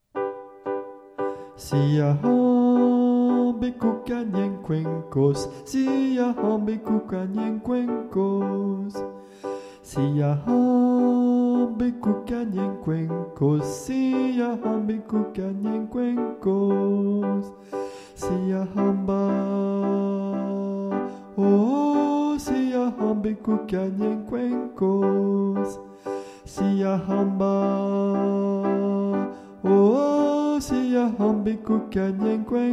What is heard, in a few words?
soprano; siyahamba (soprano)